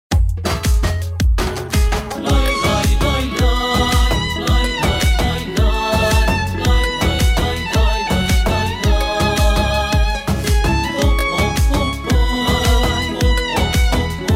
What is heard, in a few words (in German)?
Harfe G♭ minor bpm 110